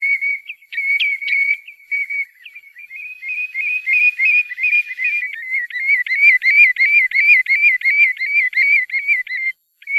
GAMBETTA

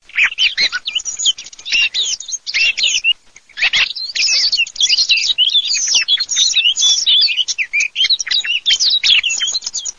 TORDO CAPINERA

